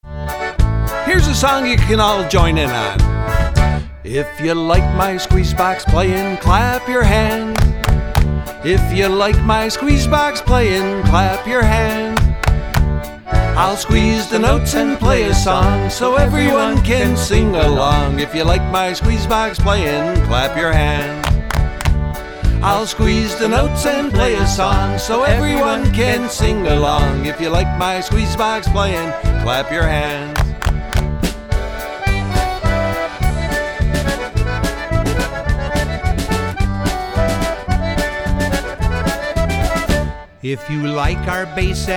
Polka

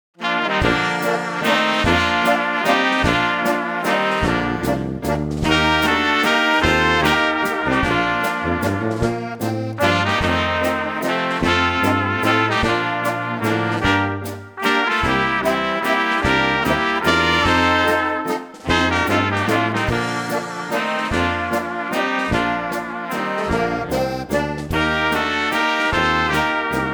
Waltz

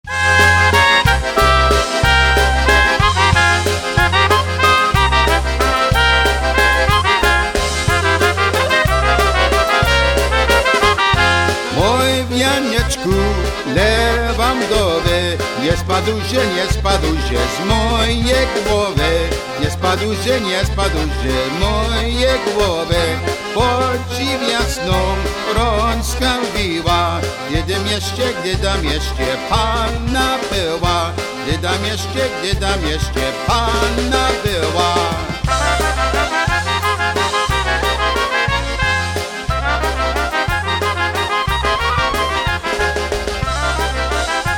Oberek

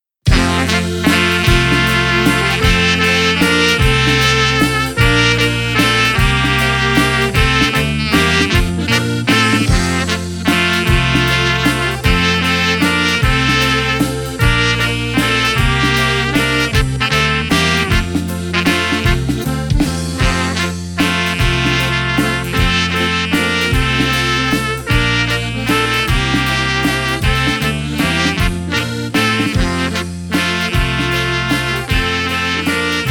Waltz

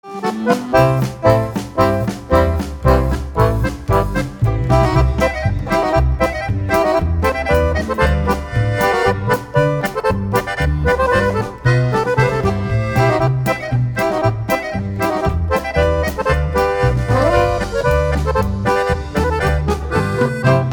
Polka

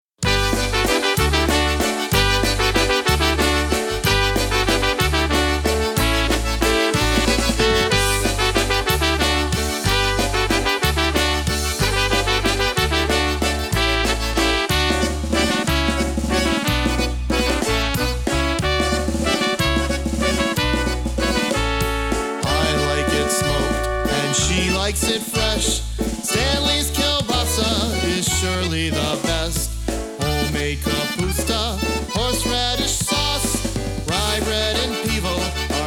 Oberek

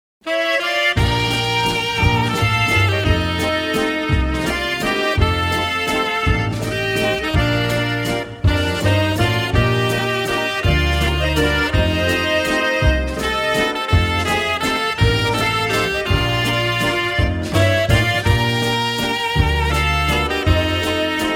Whispering Waltz

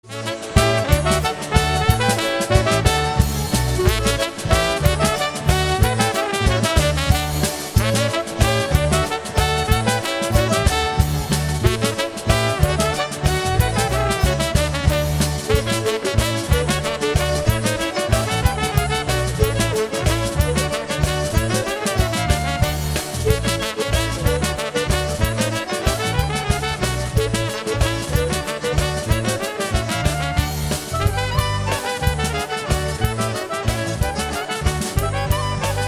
Oberek

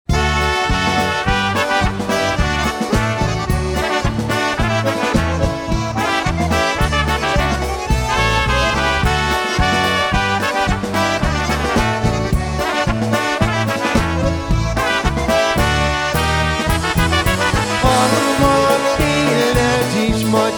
Automobile Polka Live Jam

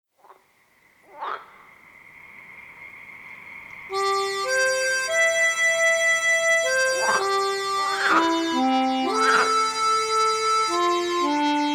ACCORDIAN

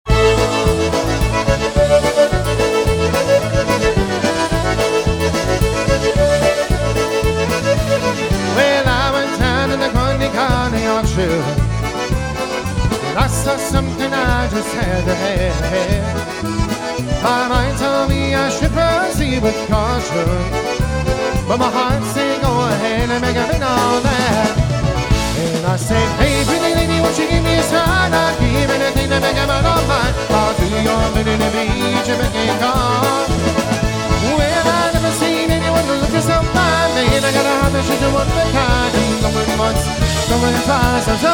Polka, Live Jam

